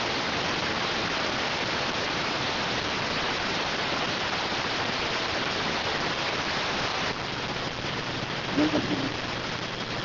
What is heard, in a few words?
rtty rx